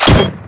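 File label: crossbow hit; weapons; z-lapik ru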